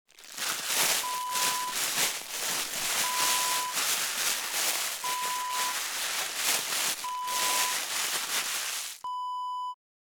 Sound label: plastic bag crumple